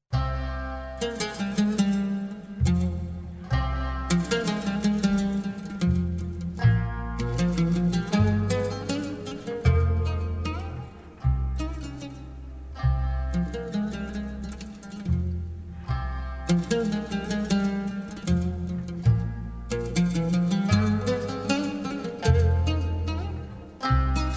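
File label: Instrumental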